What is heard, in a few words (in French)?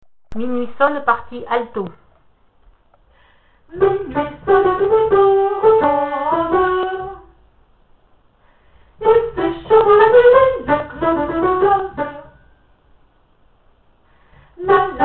Minuit Sonne Alto